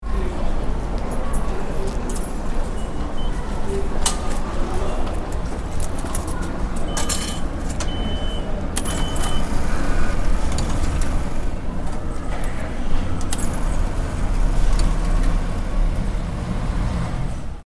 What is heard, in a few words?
train ticket machine